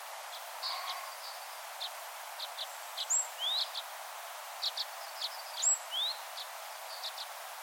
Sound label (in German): taigabirkenzeisig